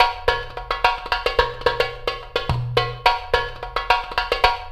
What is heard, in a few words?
TURKISH 109, percus loops